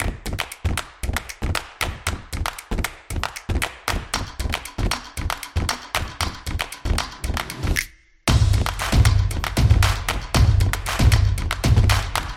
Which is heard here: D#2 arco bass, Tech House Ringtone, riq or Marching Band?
Marching Band